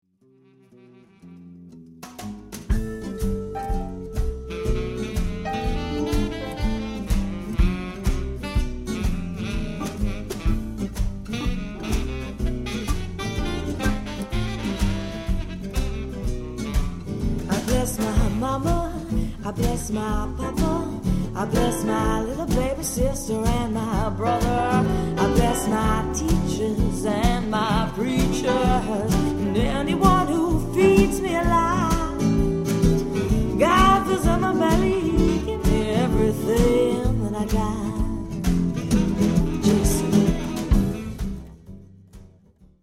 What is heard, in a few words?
Jazz Thang